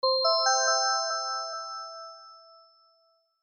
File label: Night Tone